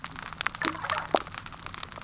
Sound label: fish jumping; soniferous; Gilligans Island